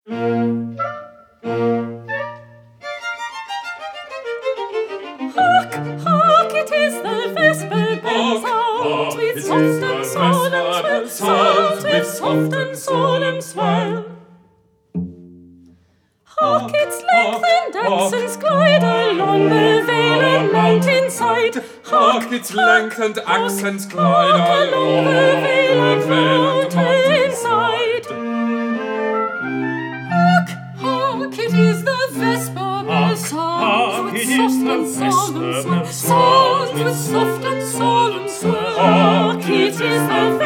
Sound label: Horn Vesper